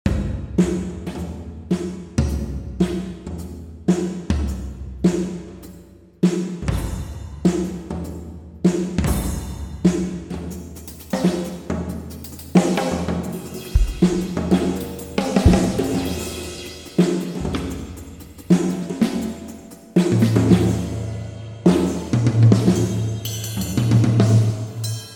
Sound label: drum solo